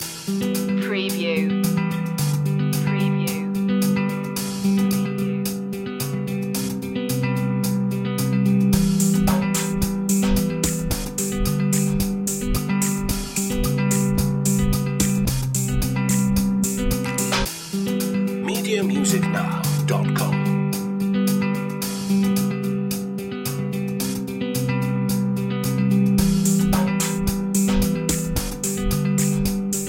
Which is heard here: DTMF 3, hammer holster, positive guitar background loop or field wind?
positive guitar background loop